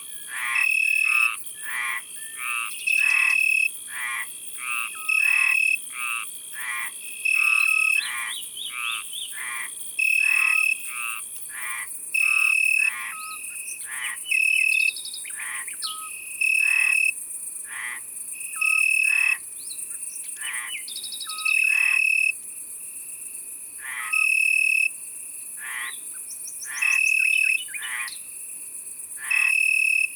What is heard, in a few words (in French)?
Grillon d Italie; abc de la nature